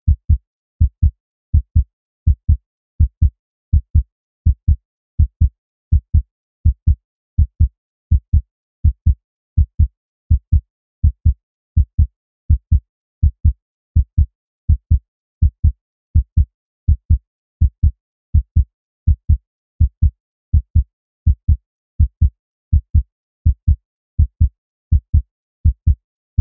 heartbeat

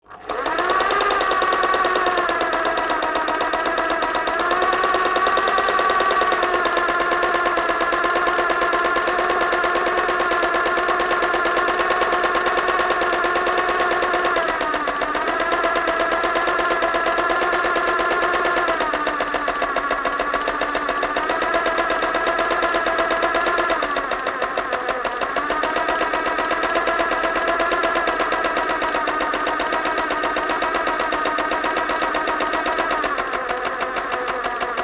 sewing machine; mechanical

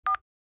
cell phone

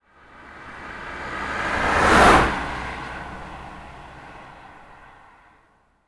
van pass by fast; transportation

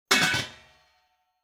pot lid close, kitchen

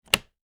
briefcase lock

